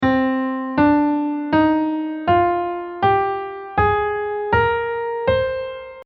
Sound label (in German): Aeolisch; musiktheorie; intervalle; gitarrenunterricht